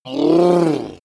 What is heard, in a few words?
reptile choke call short